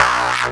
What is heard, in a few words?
DAGGA BAD PASS LFO, bass